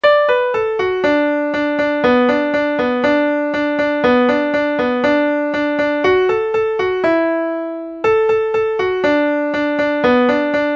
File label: chinese polka